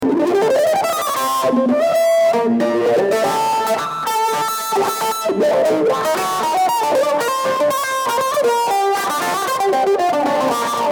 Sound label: Kentucky Mandolin